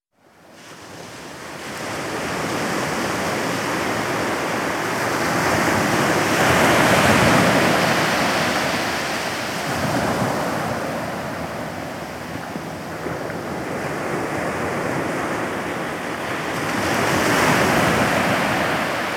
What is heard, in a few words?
Ocean Surf At Dusk; Dr. Sound Effects; 210 Nature Sounds - 20 Hours of Relaxing Natural Ambiences for Meditation and Sleep